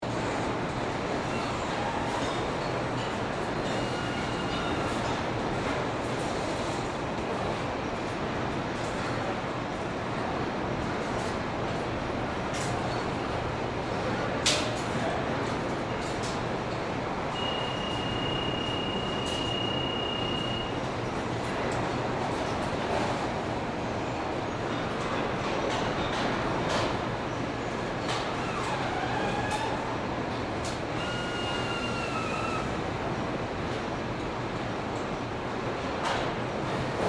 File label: Factory Conveyor, Industry, Belt, System, Assembly, Area, Workers, Plant, Loop; General, Motors, Assembly, Plant, Gm; Conveyor Belt Sound Effects; Blast Wave